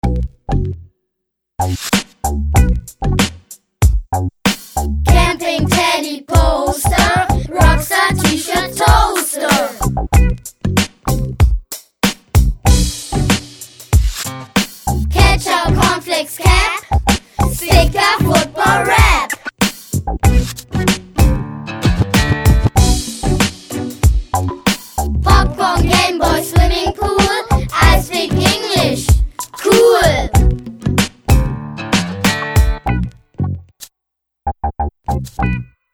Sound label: rap